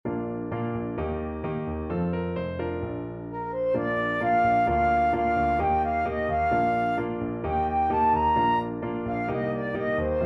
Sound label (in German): Playback Bb-Dur